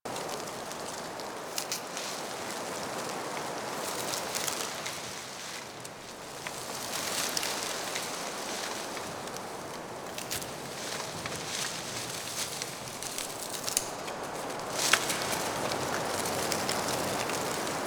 Exterior Foreground Winter Trees Loop; Ambience; Warframe; NineteenNinetyNine

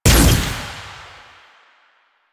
Fire Shot

